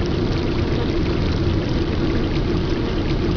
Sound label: drips